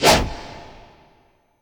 Magic, Whoosh, Poof, Big, Reverb

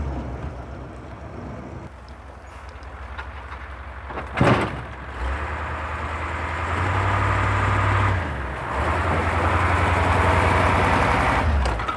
SNOWPLOW; winter; Jerry Apps Winter

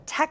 Birds

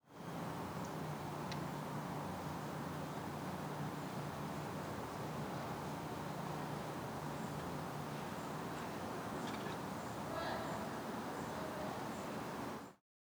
PowWow Bowl Quiet Trees with Kids; Menominee PowWow 4 Aug 2023; Menominee Homecoming Powwow August 2023

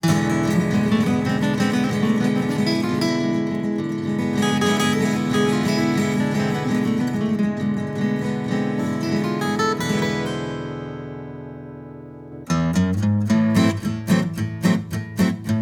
City Break; Synctracks Ltd; Georgia OKeeffe

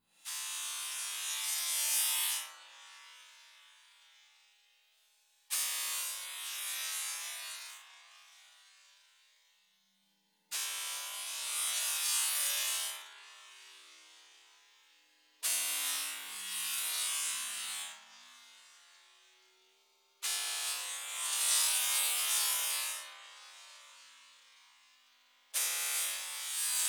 Scan Sizzle, Sharp, Processed, Metallic; Universal SFX

